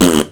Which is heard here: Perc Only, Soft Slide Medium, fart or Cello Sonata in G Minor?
fart